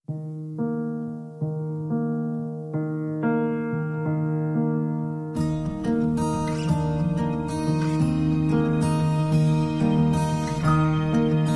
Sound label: Acoustic